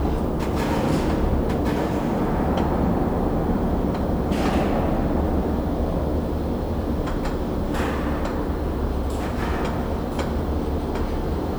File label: ambience hangar